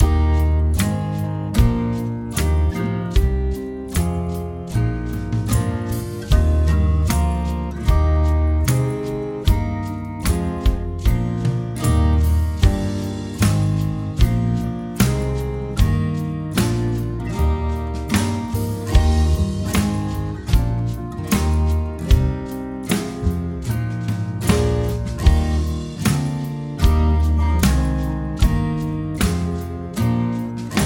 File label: Eb C Major Scale, brass